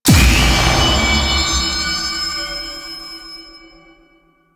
Enemy Spawn

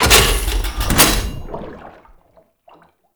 Vial Hazard Restock Tube; Entrati; Hazards

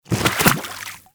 Small Land Water, Footsteps; Enemies; Warframe

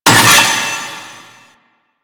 Vaults Stake Gate; Warframe; Entrati

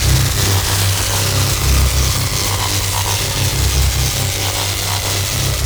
Galleon Hazard Laser Beam Loop; Vor Galleon Fight

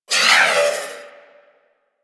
Missile Tail; DE; Warframe; Weapons; Archwing; Powers

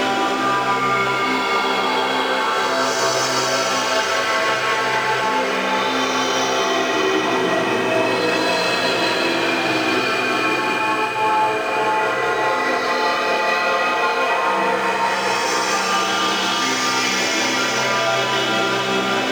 Puzzle Beacon Loop; Warframe; Ambience; Duviri; Bombastine Encounter

